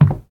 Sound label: Wood soft